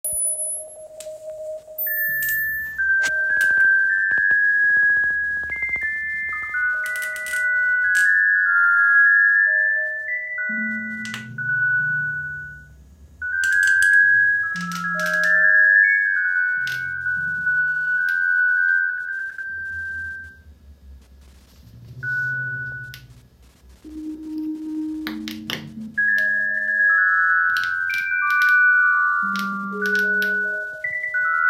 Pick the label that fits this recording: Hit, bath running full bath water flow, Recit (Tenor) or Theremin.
Theremin